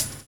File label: SERIAL BD; Univers Sons